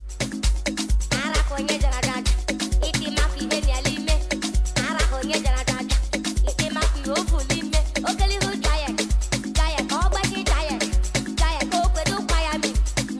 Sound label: Dance Version